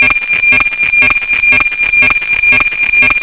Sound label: wx apt meteor3